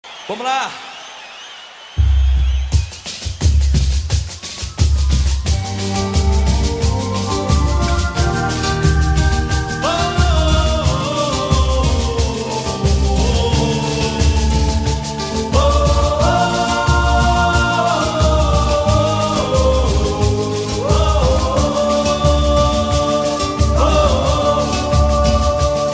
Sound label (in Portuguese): Toada Boi Garantido, Tambores do tempo, Regionais